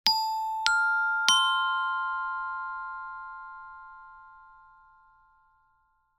PERCUSSION ORCHESTRA BELLS

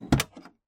door open

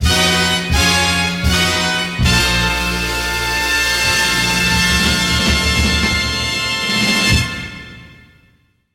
Applause Finale Fanfare

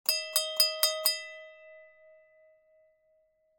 BELL PULL

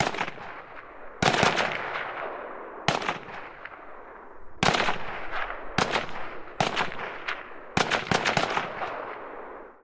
GUN RIFLE

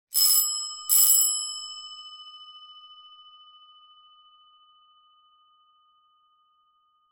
BELL DOOR

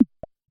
interface button

